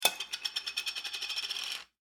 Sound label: Foley Lid Spin On Counter Set Down Plastic; Set Downs